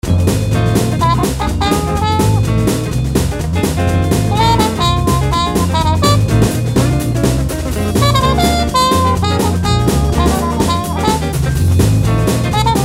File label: In F 125 Solo Trumpet; Blues Solos